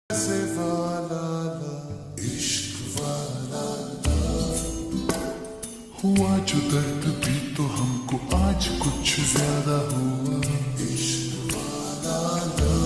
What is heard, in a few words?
Ringtone